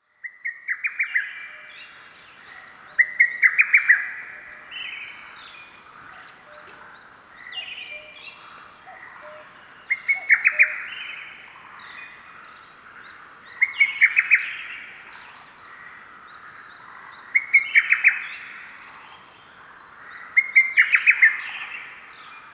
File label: bird